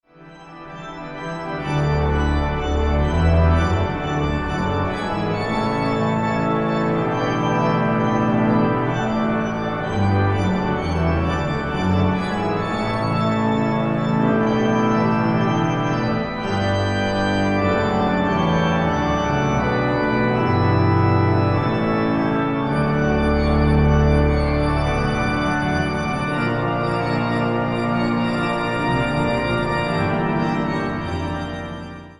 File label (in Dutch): toccata psalm